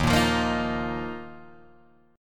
Eb Suspended 2nd, Guitar, Drop D

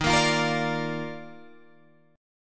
D sharp Major 7th Suspended 2nd, Guitar, Drop D

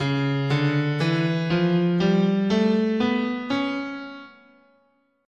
Db Altered, scale

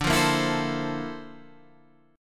A sharp Major Sharp 11th, D; A#M#11/D; strummed; JGuitar

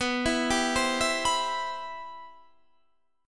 G 6th Add 11th B, chord; G6add11/B arpeggiated

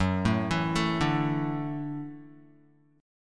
Gb Minor 7th Sharp 5th, Guitar

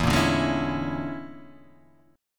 Bb 7th Sharp 9th Flat 5th E

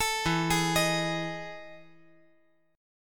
E Major 11th down

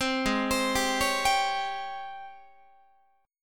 G sharp Major 11th G sharp, Guitar; G#M11 Chord

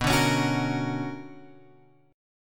B Minor Major 9th; BmM9 Chord {7 5 X 6 7 6} strummed

